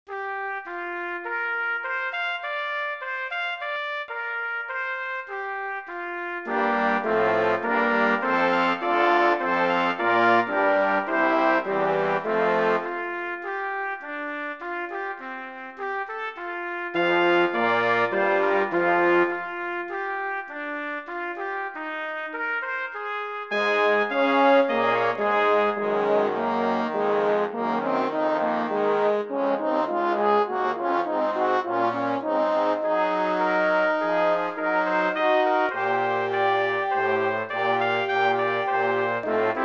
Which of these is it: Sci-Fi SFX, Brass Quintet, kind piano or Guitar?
Brass Quintet